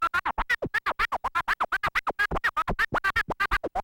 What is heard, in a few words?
Turntables